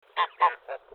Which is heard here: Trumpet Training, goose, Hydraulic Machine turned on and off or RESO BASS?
goose